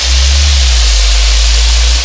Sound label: explosive missile; Earth and Beyond; EA Games